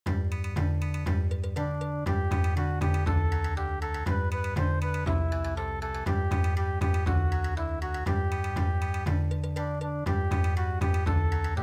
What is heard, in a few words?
Bon Odori